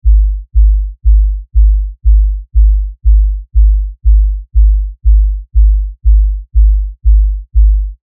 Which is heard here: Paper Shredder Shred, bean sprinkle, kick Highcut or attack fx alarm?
kick Highcut